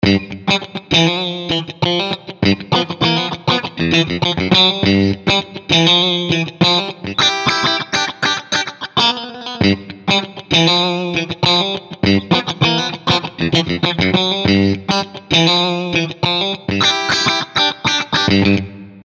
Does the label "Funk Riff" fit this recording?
Yes